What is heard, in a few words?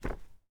Page Turn